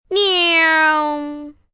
Car Passing, Imitation, 1 child, trying to sound like a car; kid; human voice; Exclamations; VoiceBox